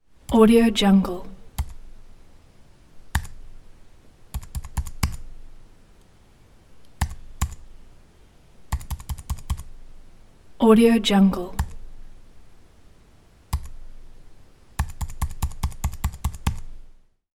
slow typing

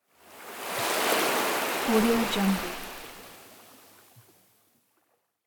sea wave

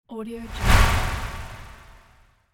whoosh effect

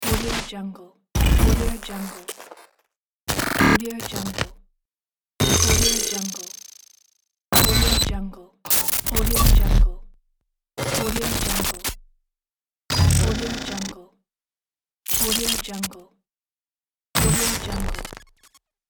digital glitches

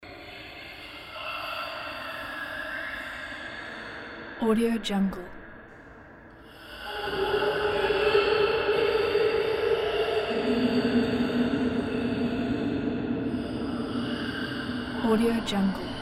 ambiance haunted place loop

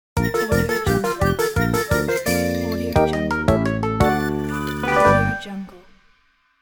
child logo; unitedsoundacademy

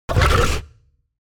animal lion roar short; smartsoundfx

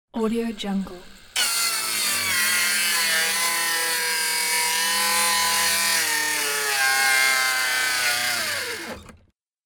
circular saw cut

